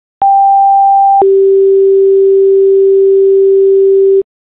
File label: siren, tones